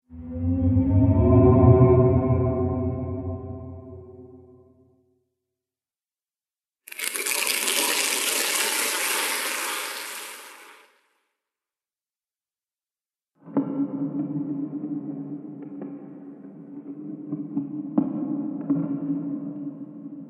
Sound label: Horror Creepy Noises; Horror Pack; Evil Sound Effects